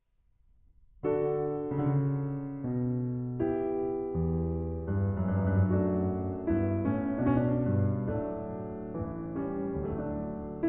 Pièces de clavecin, Suite No. 1 in D Minor, Chaconne; Pièces de clavecin, Book 1; L'inconstante